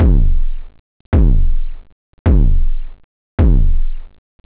core, drums techno; OctaMED SoundStudio V1

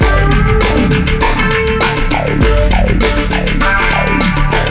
beat; Finland

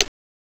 Tock; cypher

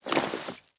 fan dance; Immortal

